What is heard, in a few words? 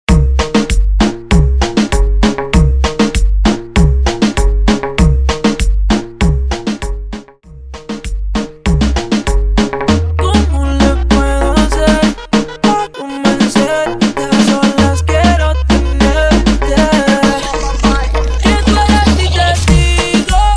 Remix 98 BPM